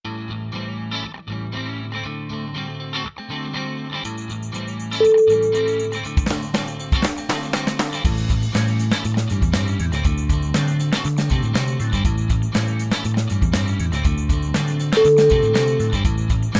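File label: funky groovy, music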